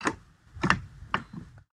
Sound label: Rail Switch; this is the zodiac speaking 2020 gamerip switch ps4 xbox one pc macos